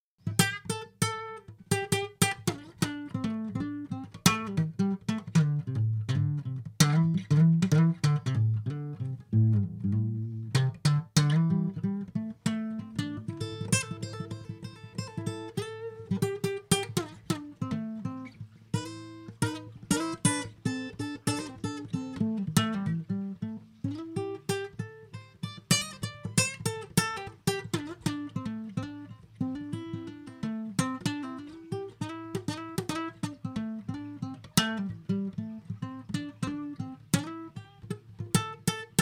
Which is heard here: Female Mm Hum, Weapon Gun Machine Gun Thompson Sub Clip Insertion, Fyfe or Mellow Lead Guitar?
Mellow Lead Guitar